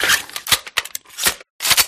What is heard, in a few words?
glock reload, guns